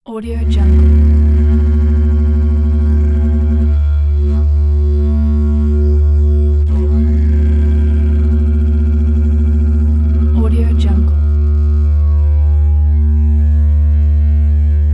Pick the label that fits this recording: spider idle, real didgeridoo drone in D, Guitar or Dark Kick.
real didgeridoo drone in D